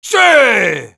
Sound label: Sniper cheers, es; ¡Sííí!